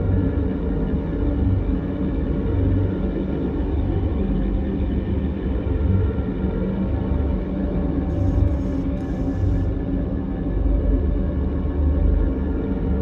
construction ship hum; Stellaris

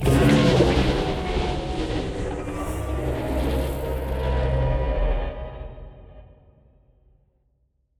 void worms mature death; Stellaris; grand archive; ships

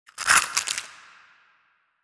expand horizontal in shells, ships; biogenesis

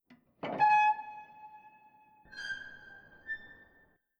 abandoned ship stinger, ambient; Stellaris

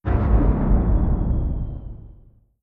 distance disruptor fire; weapons; Stellaris

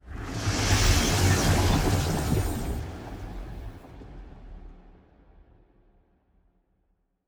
ship science ship move; Stellaris; machineage

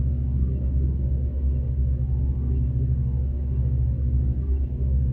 ship idle; Stellaris

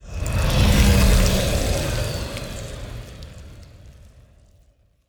ant ship mauler move; biogenesis

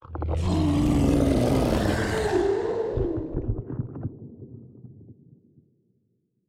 space dragon attack; Stellaris; reanimated

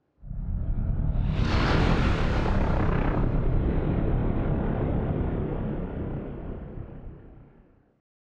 ship colossus move; Stellaris; machineage

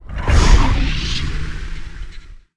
sfx destroyer moving out; Stellaris; nemesis

